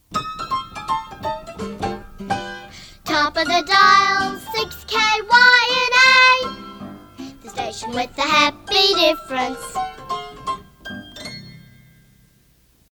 Rinky Tink Piano and Baby Jane; PAMS; 6KY

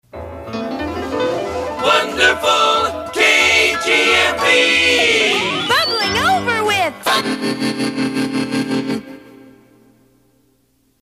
Bubbling ID; KGMB; PAMS

